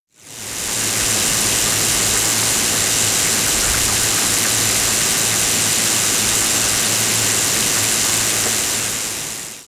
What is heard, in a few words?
mecha power flowing flowi